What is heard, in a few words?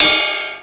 MR16 RIDE; Back In Time Records; Korg Universe VOL-1; DRUM FAMILY